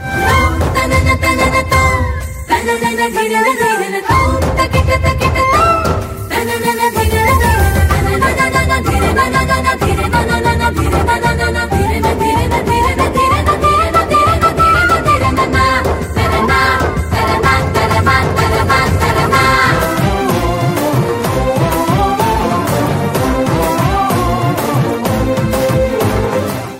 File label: BG Humming Tone Telugu Ringtone